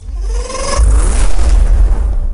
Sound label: Gallardo Startup